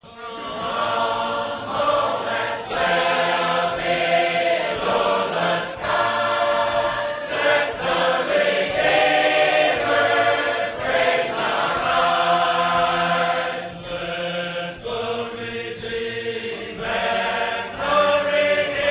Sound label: harp